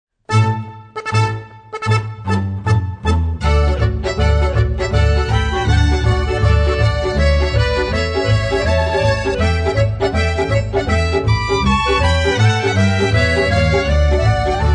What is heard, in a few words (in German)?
Expressgalopp